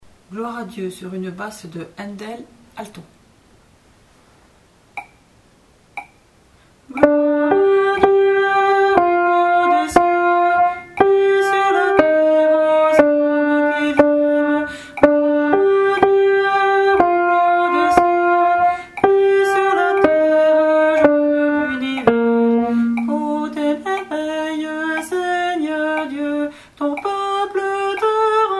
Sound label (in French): Gloire à Dieu sur basse ALTO